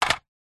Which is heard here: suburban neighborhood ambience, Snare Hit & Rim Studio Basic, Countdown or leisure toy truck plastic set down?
leisure toy truck plastic set down